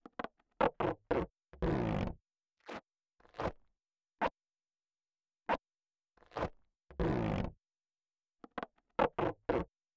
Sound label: TAPE CLOSING BOX